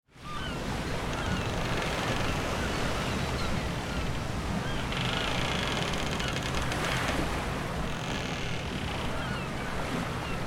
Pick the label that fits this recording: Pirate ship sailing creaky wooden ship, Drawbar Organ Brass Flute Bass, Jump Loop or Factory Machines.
Pirate ship sailing creaky wooden ship